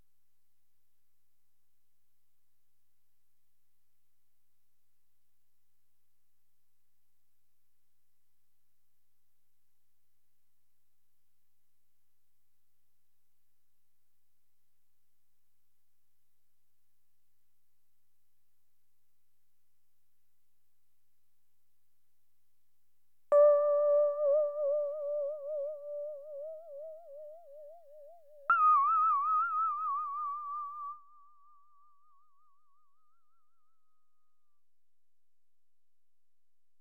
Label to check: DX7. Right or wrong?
Right